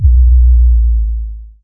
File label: bass; cstrike; Source engine